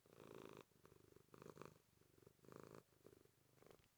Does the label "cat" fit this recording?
Yes